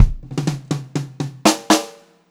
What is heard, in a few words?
Pop-Rock Drumloops; 126 POP 4